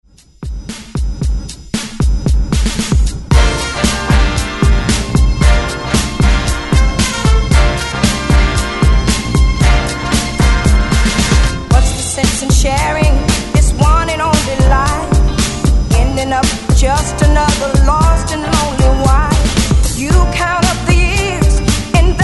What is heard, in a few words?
70s ReDrum 116Bpm; DJDOLLS